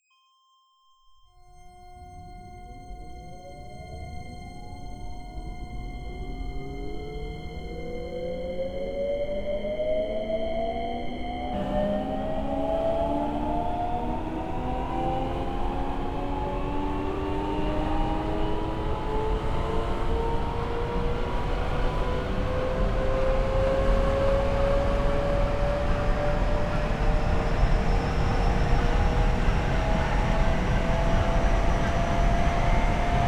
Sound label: motor noise